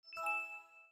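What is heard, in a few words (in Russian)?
звук Windows 7 выключение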